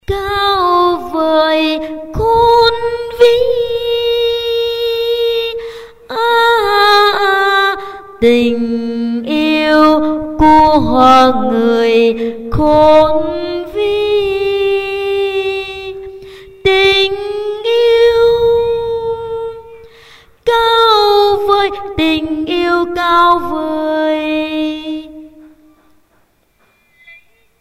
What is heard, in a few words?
tenor